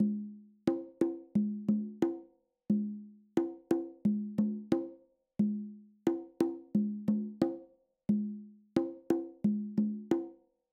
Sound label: Conga Pattern